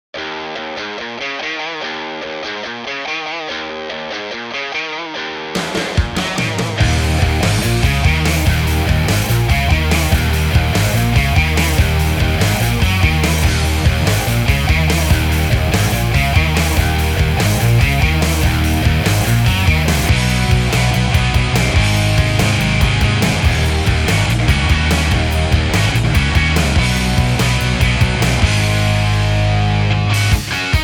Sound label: Music Rec Room